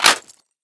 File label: mag in, rpk